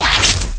disemboweled; Weird and Interesting Hits; single hits

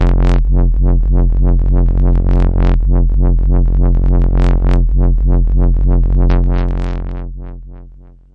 overloaded F1, Overloaded Unstable Synth Bass

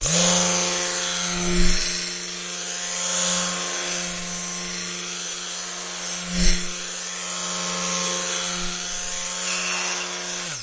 hand blender; Sound FX Real Life

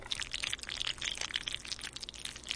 weird squishy sound; Sound FX Real Life

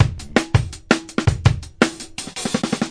165 live basic break, DRUM LOOPS and BREAKS

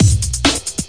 135 skip beat straight ahe, drum loops

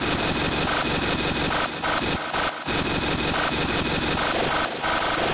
109 jackhammer song, drum loops and breaks; 101 to 110 bpm

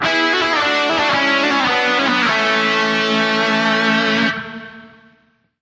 GUITARS, anthem 5ths

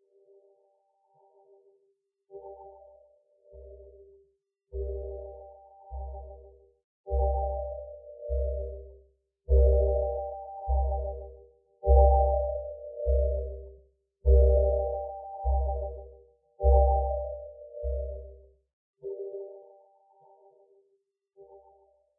cloud landing synth waves

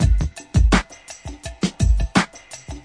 deepgroove clapper, 084 bpm, drum loop

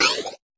fx drum; Electro FX

